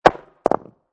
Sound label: Rock Large Drop On Cement, Impact, Rock, Large, Dropped On Cement; Rock Sound Effects; Materials